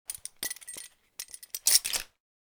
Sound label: belt sound